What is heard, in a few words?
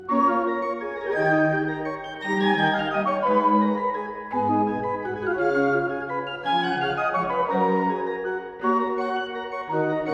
Organ Concerto No. 1 in G Minor Andante; Georg F. Handel; Op.4 HVW 289; IV.